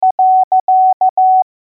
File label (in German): Punkt, morse alphabet